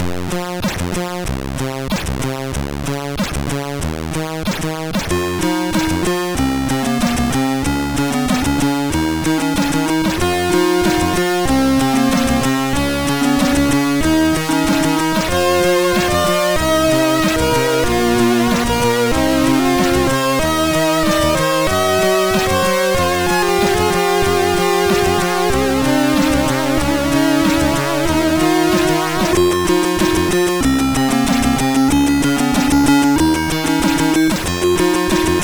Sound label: Slow Beat, MOS6581R3; hvsc